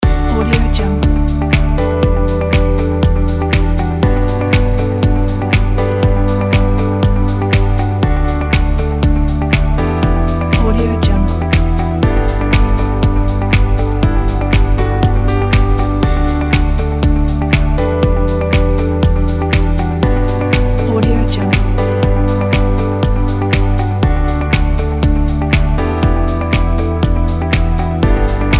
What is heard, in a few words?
uplifting corporate loop